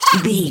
goblin fairy attack

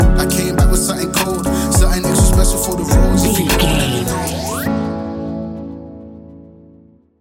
A relaxed and chilling piece of music

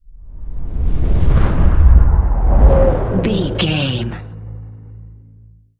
cinematic deep bass pass whoosh

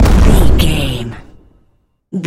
explosion large

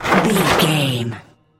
metal sheet impacts

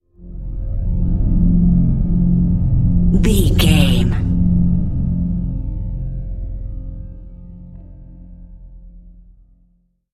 cinematic low drone

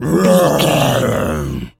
zombie voice grunt

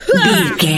voice female attack

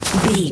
footstep snow walk

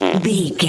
fart squirt

